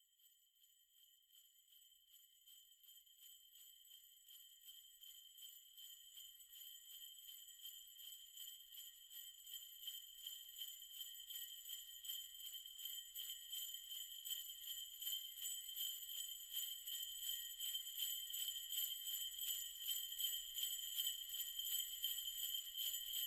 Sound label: bell